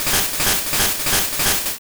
Bonemerang; Pokemon; Gen 1; attack moves; RBY

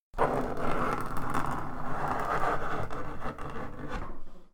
Ghosts; Drapia

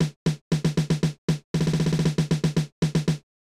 Drum Beat; Shin Megami Tensei if...; SNES

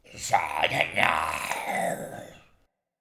attack; baal

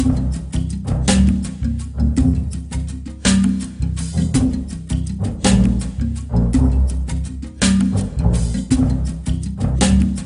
Jungle Level

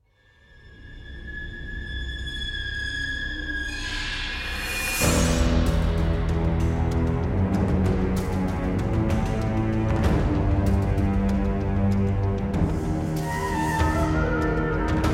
Field BGM; Xenoblade Chronicles 3

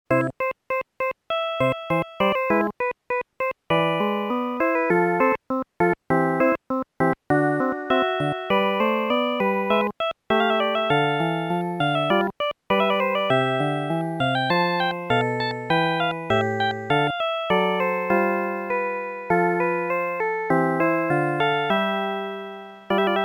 Castle Trumpet; dragon quest game music super collection vol.1

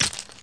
candy break

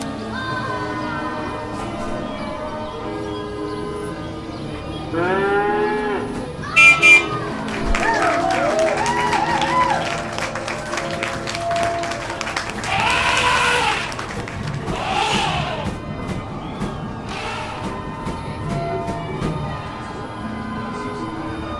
Circus Ambient Loop

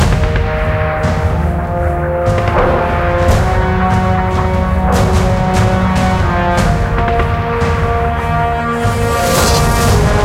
Combat Alt; Damnation

